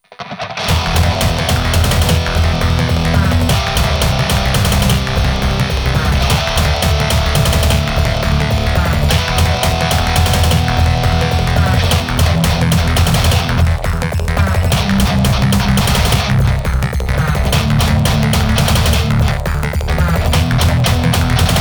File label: guitar x lsdj x mono metal boss level; weeklybeats 2024